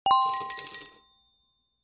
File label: avatar buy button click